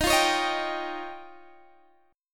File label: F 7th Suspended 2nd Sharp 5th